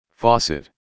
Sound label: Faucet